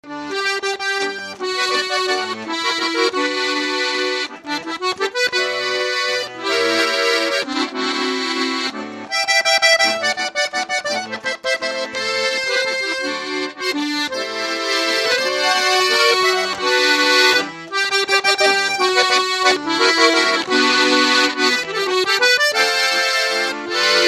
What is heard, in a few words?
Dallape 140 Italian Song Excerpt, accordion